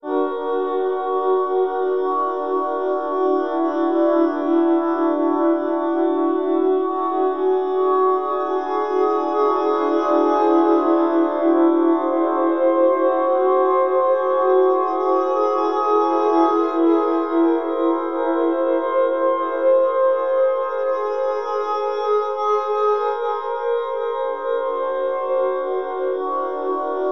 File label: WHOLETONE; Bolder Sounds - Granular